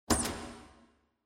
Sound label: TV Turn Off Reverb